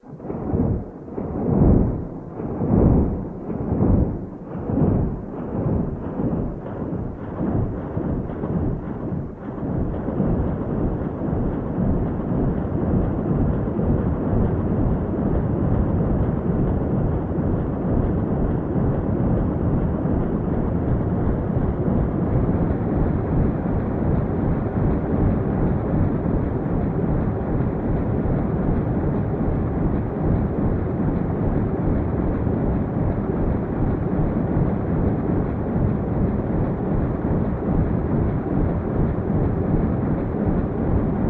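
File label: Propeller Start Up; propeller movement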